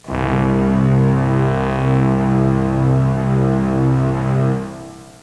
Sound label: fog horn; Sound Effects for Multimedia - Volume 1